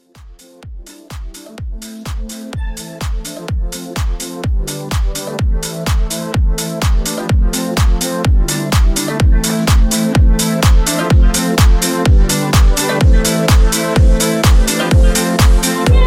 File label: House Rework Re Drum 126